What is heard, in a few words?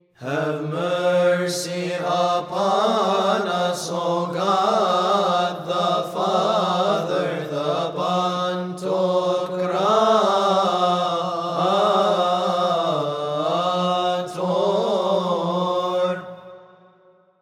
Have Mercy Upon Us